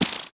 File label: Animation Electronic